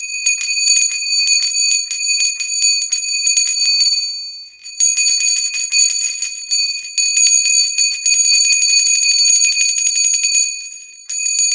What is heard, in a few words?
leather handbell